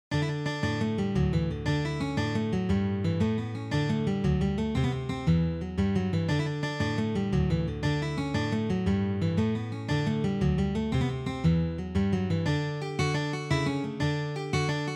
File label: Drops of Spring Water, Jigs